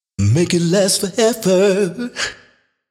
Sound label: 122 Sung